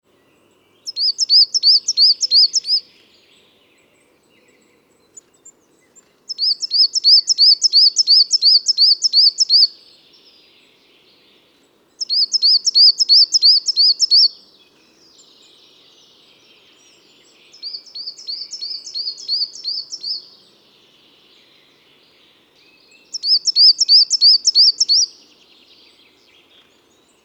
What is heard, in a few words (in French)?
mesange noire chant